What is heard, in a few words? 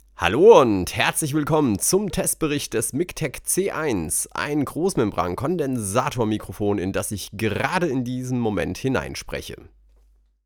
miktek c1 vocal male